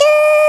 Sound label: TONE D#3